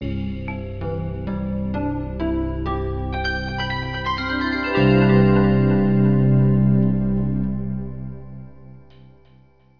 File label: boot jingle; adafruit circuitpython bundle; fruitjam